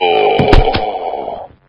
assault die

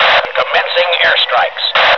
airstrikes; trempler

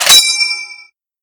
ping, garand

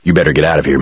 run; jc denton

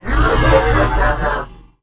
attack; unreal; warlord

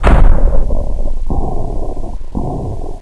heavy stop

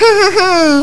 laugh; choco

